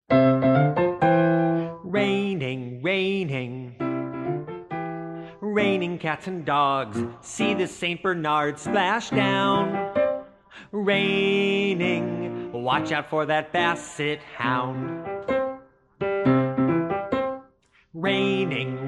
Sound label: raining vocals